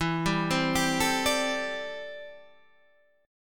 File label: A Minor 11th E, Chord